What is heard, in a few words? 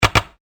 Double Click; multimedia